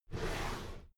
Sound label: drawer cabinet paper open regular halfway